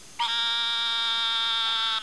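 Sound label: narrow, frog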